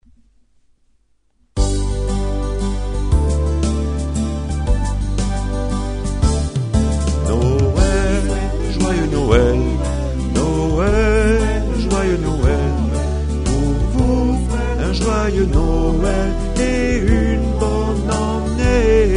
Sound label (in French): noel on vous souhaite tenor